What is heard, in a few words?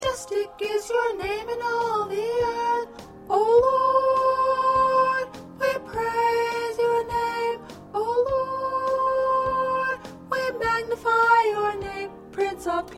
Soprano, choir parts